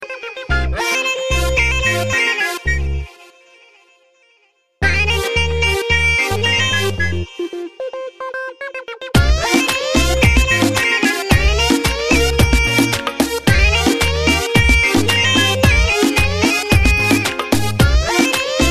detskaya melodiya

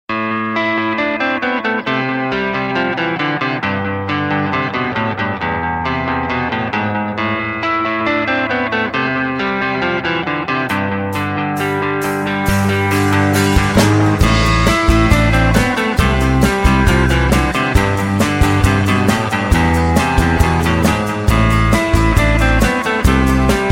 nashi yunie smeshnie golosa

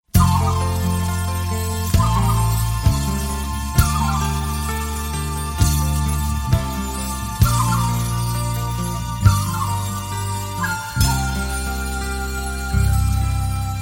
prosto horoshaya melodiya

